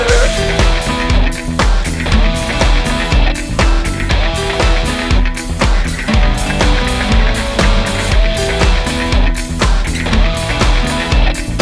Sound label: Dance Floo